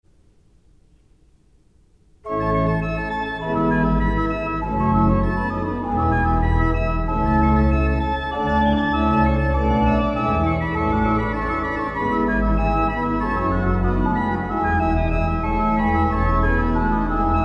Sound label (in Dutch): Holfluit Prestant en Woudfluit met Subbas en Koppel